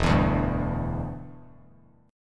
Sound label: E Minor 7th Bass Standard; strummed